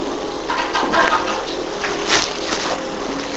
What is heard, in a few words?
unknown clanking; Morrison Lodge 76; EVPS